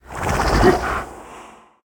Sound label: chariot horse breathe; smite; Apollo